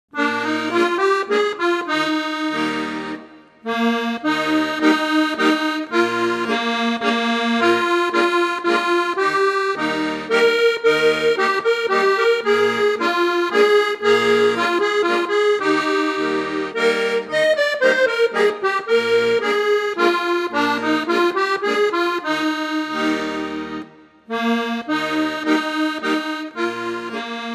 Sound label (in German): inst; es toenen die lieder